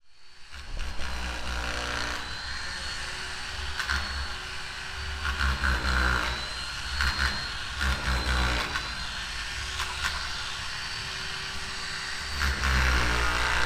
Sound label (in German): SONY PCM-D100 Atmo